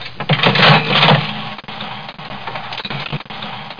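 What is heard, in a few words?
slots pull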